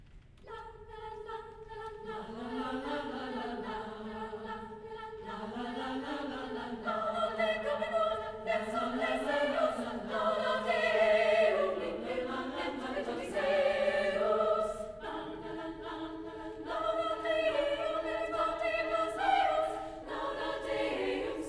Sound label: Vox Femina